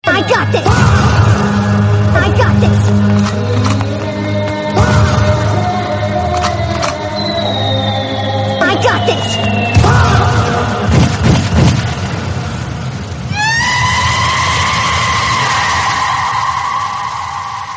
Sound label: shooting